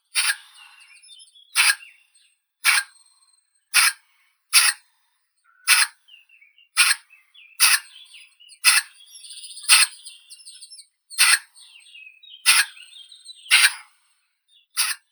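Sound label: scops owl Otus scops young bird, nature sounds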